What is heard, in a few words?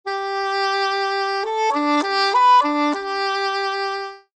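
English horn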